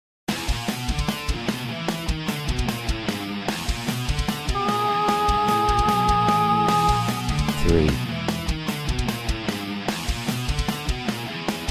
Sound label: Key of E Med, heavy metal; real heavy metal ear training